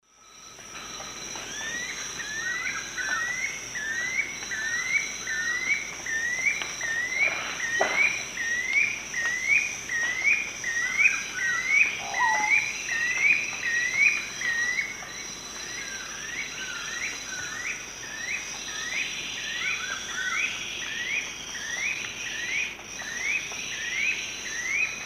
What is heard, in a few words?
Bucco tamatia, duet; S. America; Eastern Lowlands